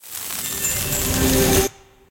particle start; wingsofriches

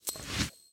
sym travel; serengeti kings

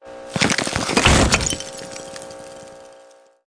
win; space wars

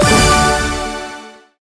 win big drop; dazzle

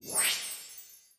idle In; joker pro

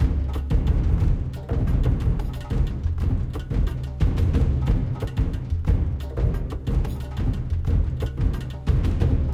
Freespin Intro Loop; mercy of the gods

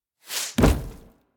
chest open; wild bazaar

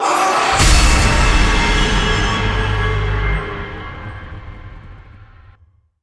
large Win; halloweenjack

